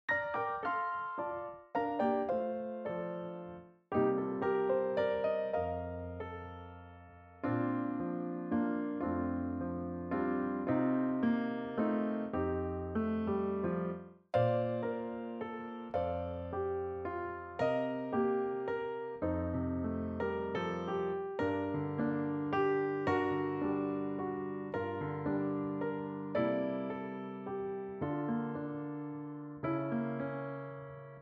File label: piano